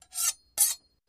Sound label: Knife Sharpen Sharp, utensils